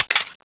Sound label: reload clip out, weapons; thanatos1